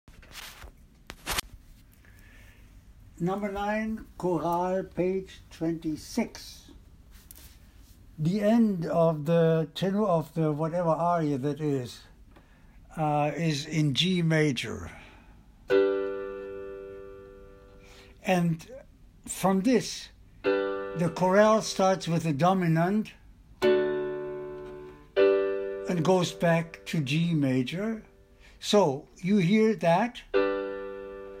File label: BWV 117, Alto